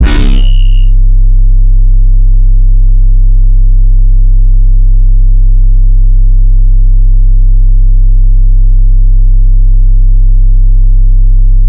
808 C, bass; NAOMI; DEAD SPACE

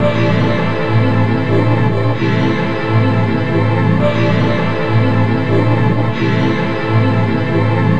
Orchestra Drone; Sonic Foundry (Sony Creative Software); Myths of Technology

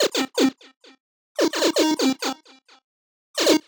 130 C, uk garage, Synths; 130bpm Lines n Loops

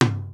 Yamaha RX-5, drums perc

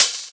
Open Hhat, Hihat; Equipped Music; Loungin House

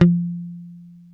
F 4, hip-hop, bass; Spectrasonics; Bass Legends CD 1

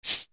CLOSE SHK, Yamaha RY-30

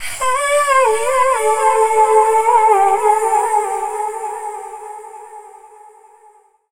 Dance Vocals, FEMALE

